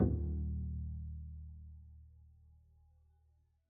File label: pizz eb1, double bass; dsmolken